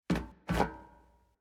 Footstep Metal Rotate; Silco

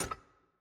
Stuck Pin Shaft; Puzzle; Monkey

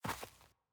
Footstep Grass

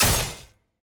Enemy Attack Basic Hit; bloom minigame

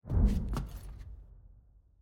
Music Box Enter

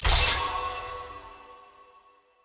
UI Click; Kayle; bloom minigame

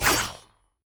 Enemy Attack Basic Cast; bloom minigame

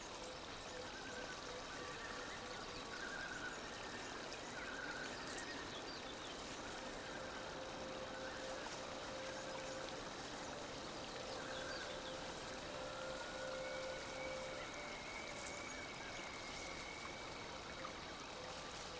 amb forest; kami; spirit blossom

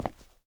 footstep; bloom minigame

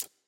Cable Rolling; Puzzle

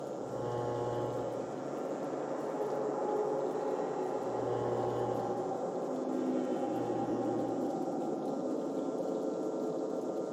Emitter Bar Lights Pink

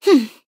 Nonverbal Happy; Aurora

